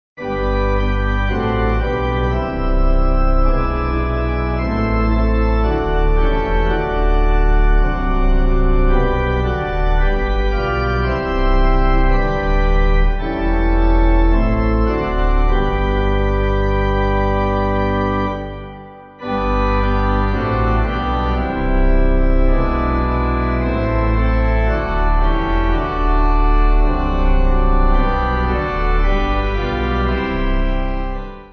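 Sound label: Pipe, Pipe Organ; God Who Made; Sommerlied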